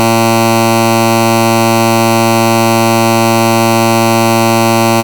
Error Tone Steady Low

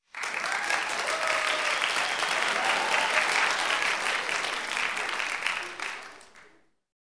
Warm Applause in an enclosure

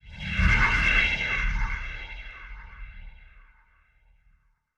Up-close fading dark dash

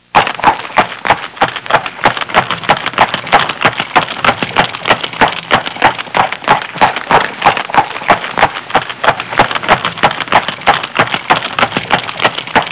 Farm animals trotting

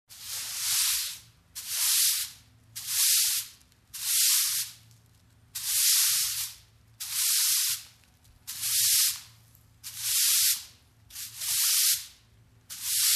Broom Sweeping

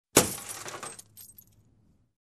Car window shattering

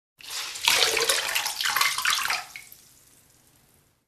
Pouring Water in a tub

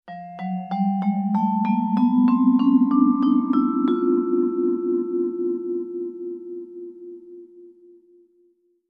Vibraphone ascending scale

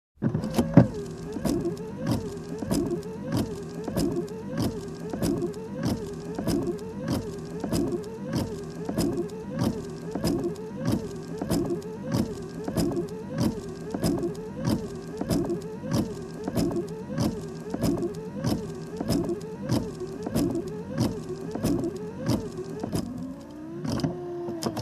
Car Windshield Wiper

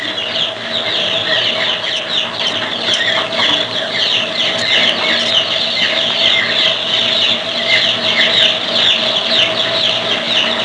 Farm chick chirping sounds

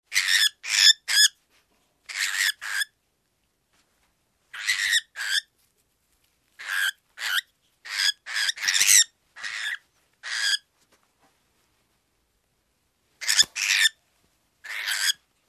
Hatchlings shriek

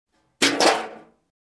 Metal Trash Can Lid

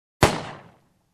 Gun shot sound

